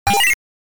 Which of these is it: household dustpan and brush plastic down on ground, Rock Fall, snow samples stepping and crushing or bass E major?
Rock Fall